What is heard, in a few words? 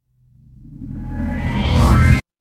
transition riser